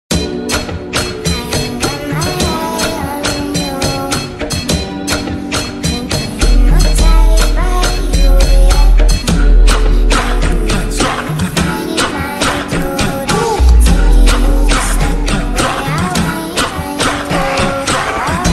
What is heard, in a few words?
brazilian funk remix slowed reverb